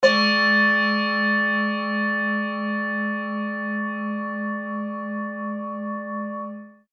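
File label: tibetan bowl; neptunset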